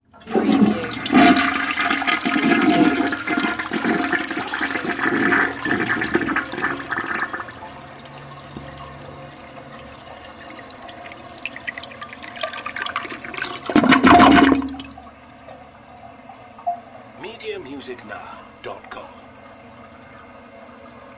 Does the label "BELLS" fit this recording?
No